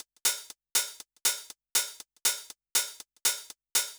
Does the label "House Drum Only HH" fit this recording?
Yes